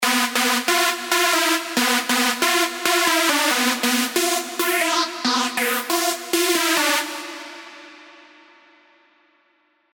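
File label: lead, Sylenth1; Sledgehammer; alonso 18 year anniversary pack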